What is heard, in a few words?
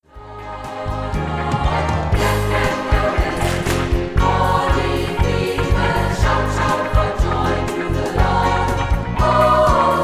clap clap your hands, gospelchor